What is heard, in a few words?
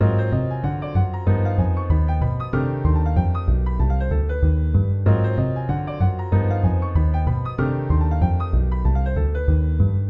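voicings with bass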